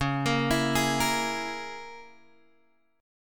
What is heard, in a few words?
C sharp Minor 6th C sharp, Guitar Open D